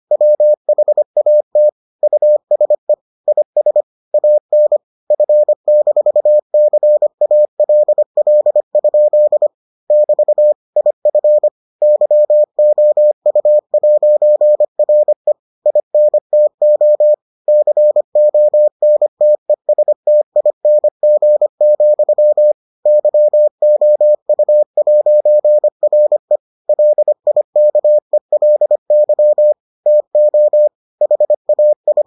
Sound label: morse